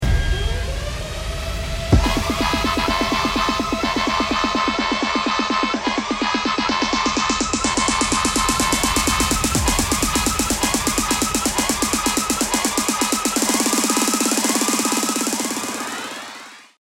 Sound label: round ten sec count, music